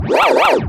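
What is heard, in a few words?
scratch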